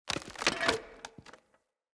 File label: Barrel Wood Hollow Medium Destroy Chunk